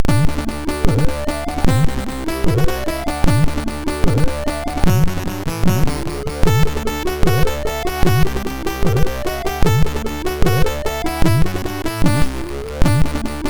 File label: Jingles, sid MOS6581R2; Deek